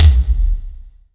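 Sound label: SDS V BD, bass drum; Best Service; Real Mega Drums VOL-1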